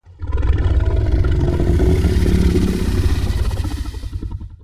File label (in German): Utahraptor